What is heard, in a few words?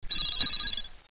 magic reflection; easyrpg; WitchsHeart